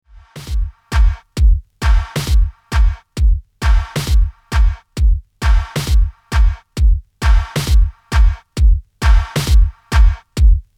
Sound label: Drive HPF